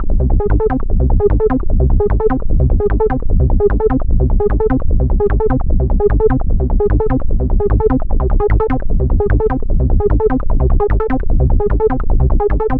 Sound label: Acid Bass; acid techno trance vol2